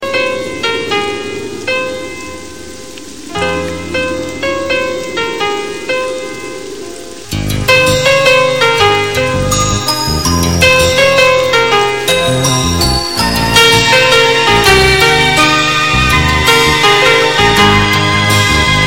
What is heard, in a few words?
Sweet Tune